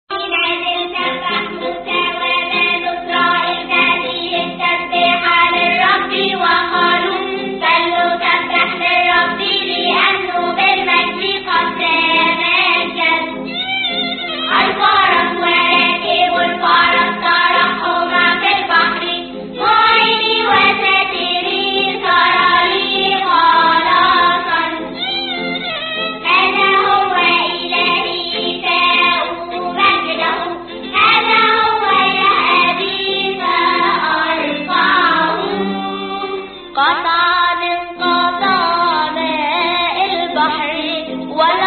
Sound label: Arabic; praise 1st hos; Little Flock Team عربي فريق القطيع الصغير